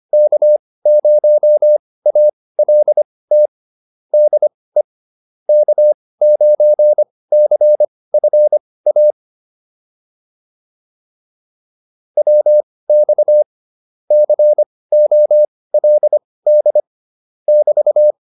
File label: QSO, intermediate practice files